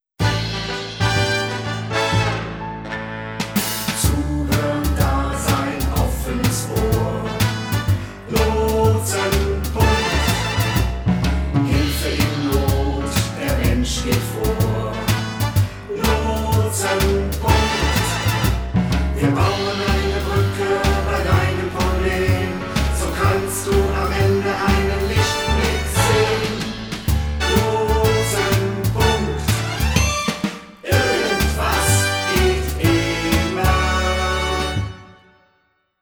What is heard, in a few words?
jingle vocals